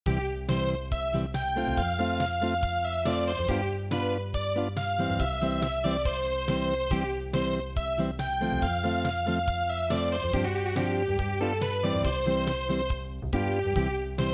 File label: Acoustic